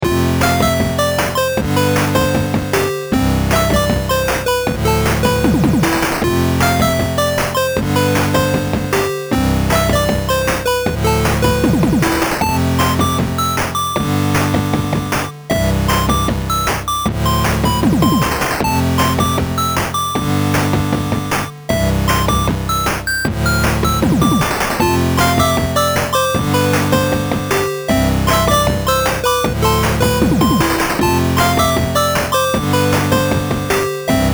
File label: town music